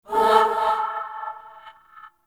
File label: Cast; Warframe; Warframes; Bard